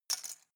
cutlery plastic drawer place

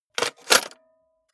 cassette into cassette player hard

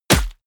bullet large impact body

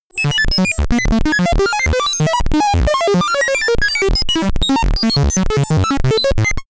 space controll

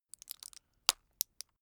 plastic drug blister press out short

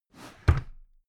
drawer cabinet carton close heavy halfway hectic

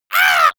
monster painful cry high

